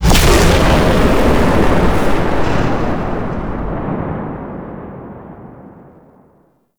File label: Void Dragon Firebreath Cast; Enemies; Abilities; Warframe; Duviri